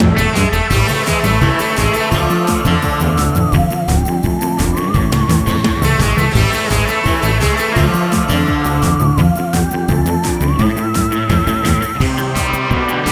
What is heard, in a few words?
Combat High loop; Graveyard Ops Combat A